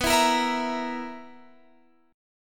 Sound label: B Major 7th Suspended 4th B down Guitar Open F; strummed